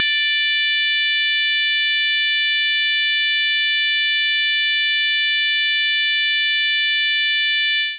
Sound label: Logarithmic F#1-C7; Notes and Clusters